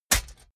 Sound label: metal hit clank bright